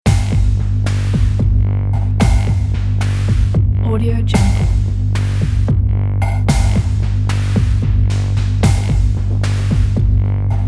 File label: action scifi music